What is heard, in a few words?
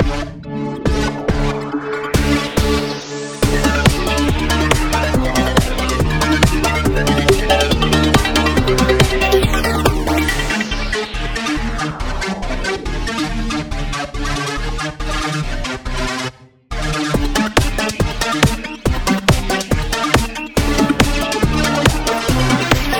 hippie crackhead music